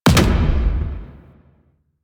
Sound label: Sniper Alt Fire Punch; DE; Warframe; Frumentarius; Exalted Sniper